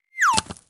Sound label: Bananas Fall; gnarled forest